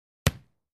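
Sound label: Football Kick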